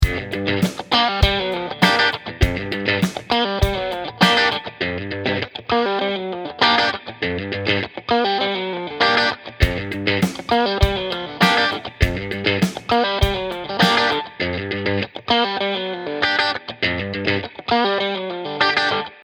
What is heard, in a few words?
Drum2